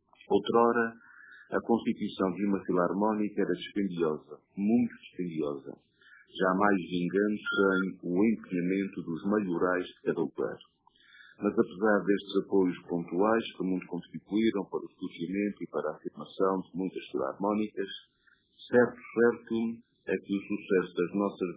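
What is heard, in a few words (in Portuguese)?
Filarmónica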